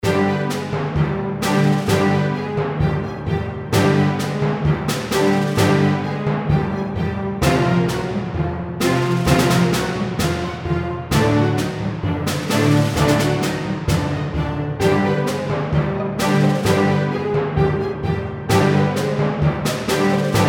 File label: Desert March